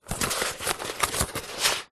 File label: cardboard box small lid flaps open close movements; zapsplat